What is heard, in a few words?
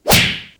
slap hit; gmod